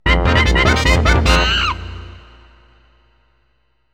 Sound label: Success; Donkey Kong 64